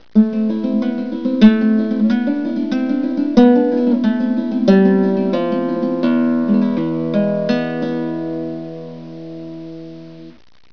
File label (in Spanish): solea